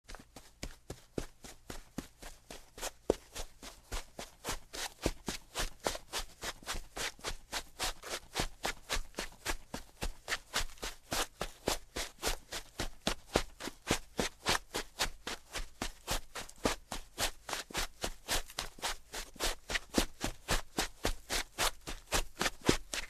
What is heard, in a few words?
Running on dry sand in shoes; steps